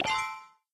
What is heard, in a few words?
complete task